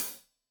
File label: Hat Loose, Hi-Hat, Cymbals Stereo